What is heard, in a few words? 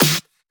Snares; Vengeance Samples